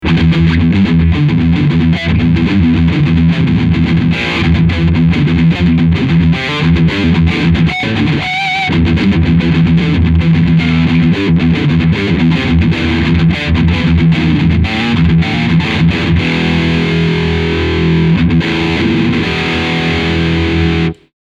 High Gain

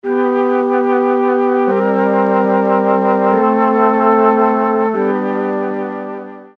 Flutes chords

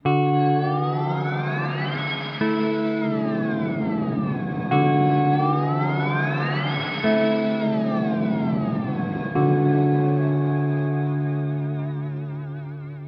Earthquaker Devices Astral Destiny Cosmos

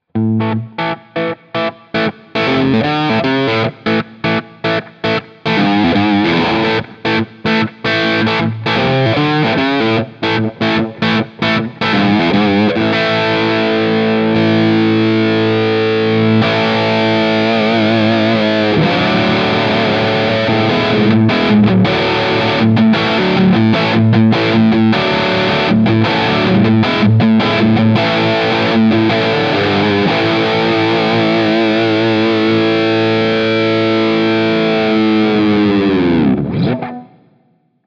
Drive 4x12 Vintage Basketweave; Scuffham Amps S-Gear; The Jakal

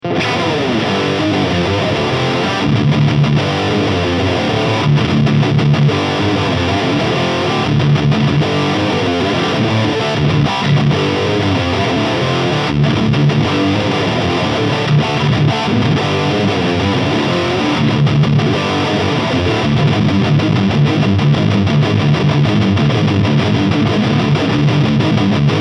Soldano SLO 30 High Gain

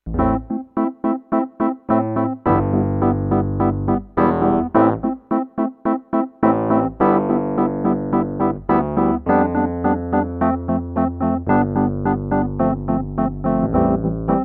Reed EP

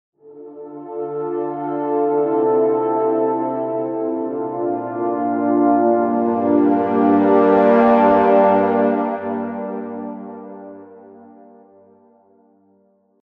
Horns Long Sustain 100 Percent WET Reverb; I-Horns 12; Taj Mahal Reverb

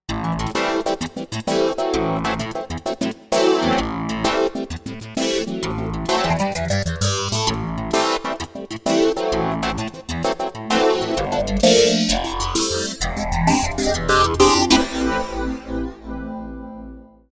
Montage

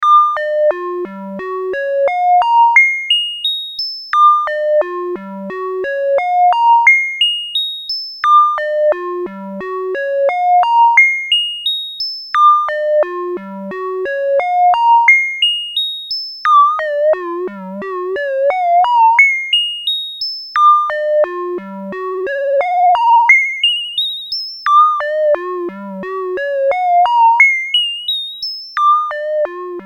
EMS Logik

